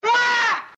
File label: whaah